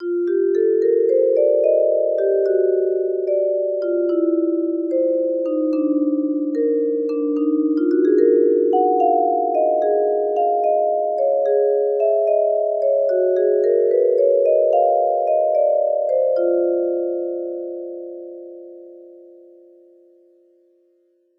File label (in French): percussion